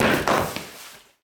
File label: Bodyfall Tile; SFX Kit; Bodyfalls